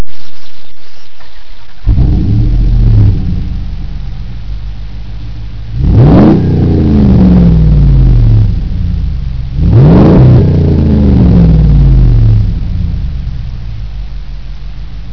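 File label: Startup and rev, cat back dual magnaflows, dodge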